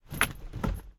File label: foley hit; driver; Blind Drive